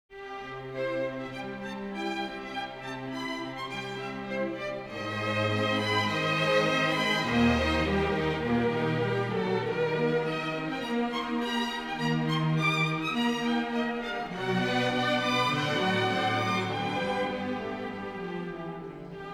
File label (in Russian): Симфоническая сказка Тема Пети; Прокофьев; 'Петя и волк', соч 67